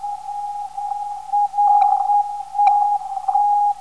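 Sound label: WJT 303kHz, non-directional beacon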